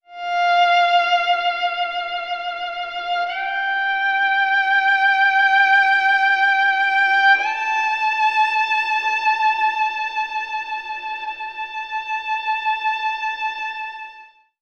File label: strings lone violin, Sad; Loops